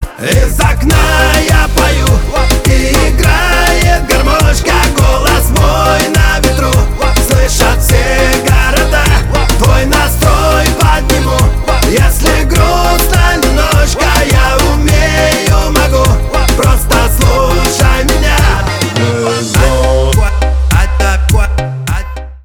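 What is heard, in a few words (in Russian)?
garmoshka, accordion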